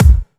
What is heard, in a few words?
Kicks; Vengeance Samples